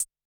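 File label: Electro Kit Closed Hat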